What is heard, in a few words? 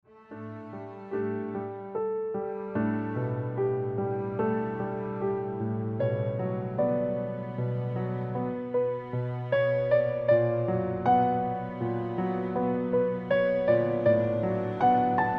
Dying Piano